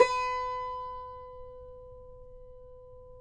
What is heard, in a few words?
CUMBUS B, Guitars; Spectrasonics; Hans Zimmer Guitars VOL-2